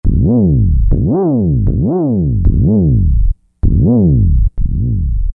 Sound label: Jack Slide, Korg N364; Factory Prog demos